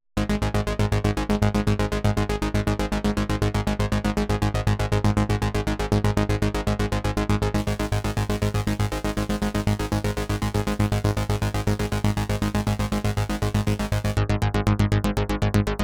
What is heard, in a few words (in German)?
PIGMENTS 3.5 Klangbeispiel Filter Typen; ARTURIA